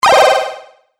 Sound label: Classic Delay Game Hit, video game sound effects